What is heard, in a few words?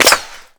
ricochet; Escape from Tarkov